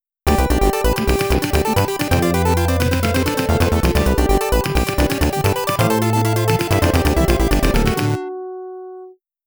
Carnival Stage Clear

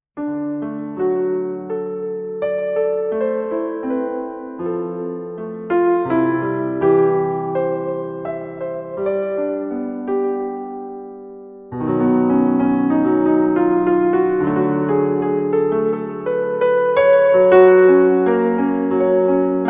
View Of The Island (Piano)

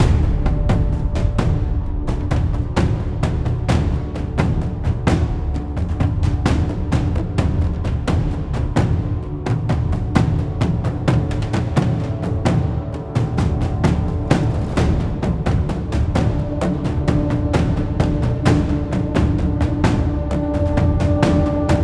Battle Perc Loop

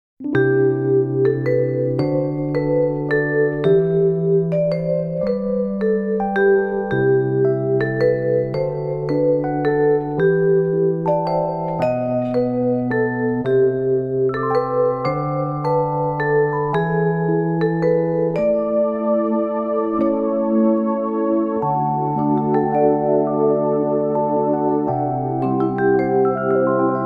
white vibraphone

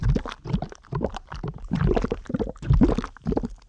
Squish

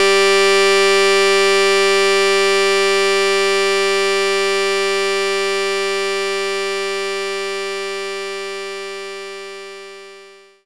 M5th wave G4

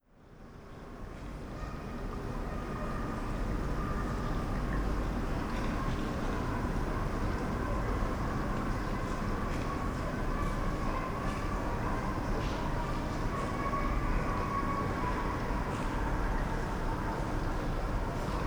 Creepy Night City Ambience; Welcome to the Game II

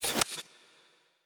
Bow Hit; We Are the Dwarves

